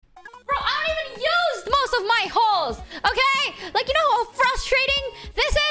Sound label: frustration